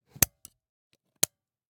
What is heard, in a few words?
Camera, Pentax KS2, DSLR, mechanical, lens cap, remove, replace, XY; Eiravaein Works; Vaeyan IV